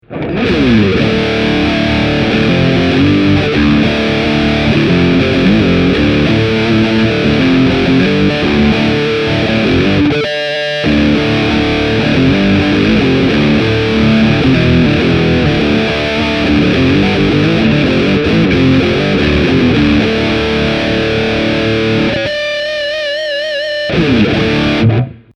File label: classic metal